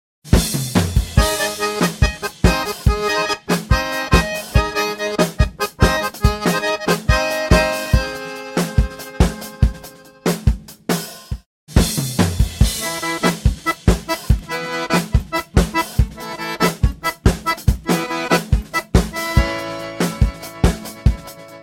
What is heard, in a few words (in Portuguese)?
loops sanfona arrocha em qualquer tom ou velocidade